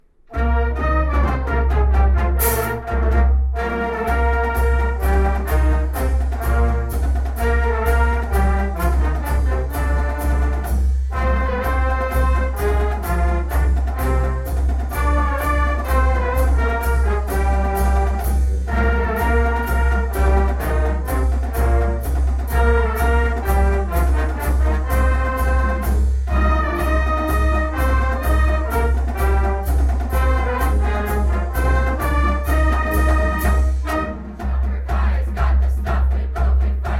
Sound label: Victory Song; Saxon Victory Song; Schaumburg HS; Fight on all you Saxons, / Lead us on to victory tonight, / Raise your voice to the highest, / For the red, for the gold, we will fight.